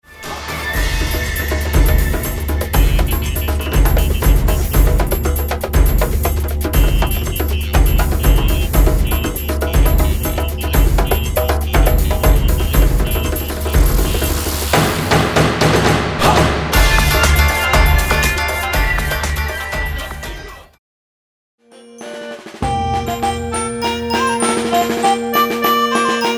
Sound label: perc sample